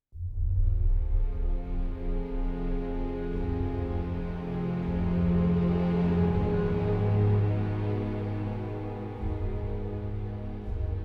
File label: music in queue loop; ruby